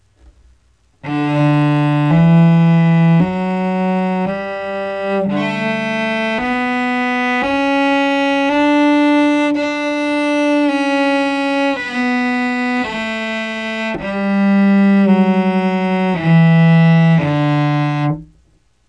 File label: D Scale